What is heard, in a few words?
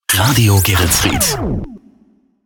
Jingles Shotgun; Radio Geretsried